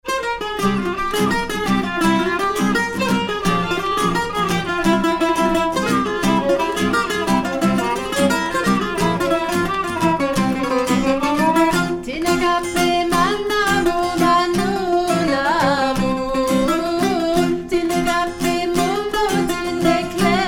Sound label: syrtos greek trio